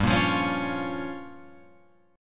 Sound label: G Minor 7th Flat 5th, Guitar Open F; Gm7b5 strummed; JGuitar